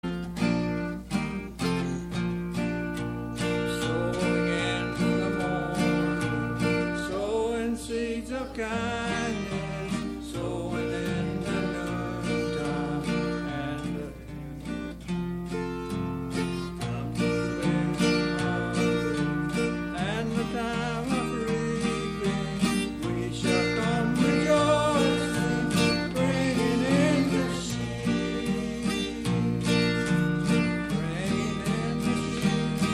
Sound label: Choir; Bringing In The Sheaves